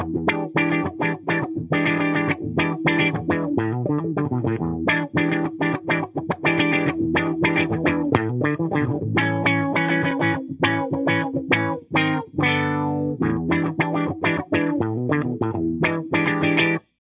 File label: Auto Filter